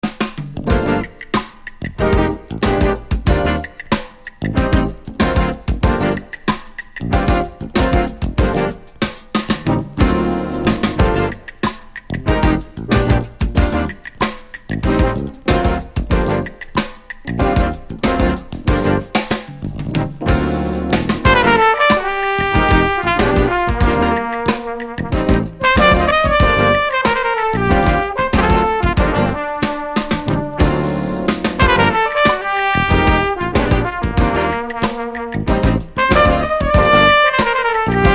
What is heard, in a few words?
JAZZ Fusion